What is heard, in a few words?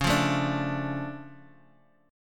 D Minor Db, Dm/Db chord, guitar; Dm/Db strummed; JGuitar